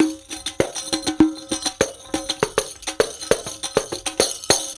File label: Tamborine; Ambient Grooves; World Groove